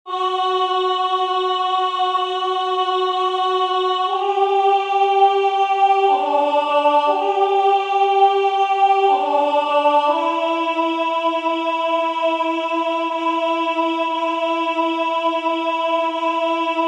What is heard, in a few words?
verset 1 2 choeurs Alto; Monteverdi; Vespro; Ave maris stella (vespro); Baroque